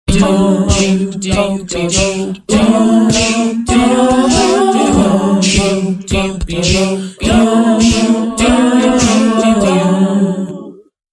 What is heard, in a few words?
awesome vocal practice fx